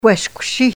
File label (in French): siffler; contenus autochtones